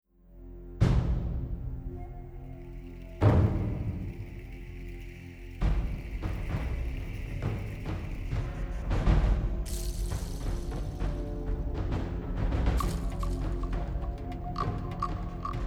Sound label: tribal drums